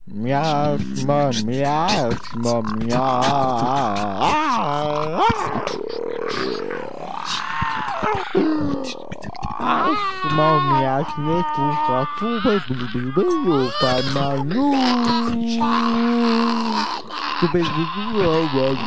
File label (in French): Choeur